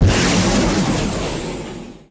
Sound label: Xplosn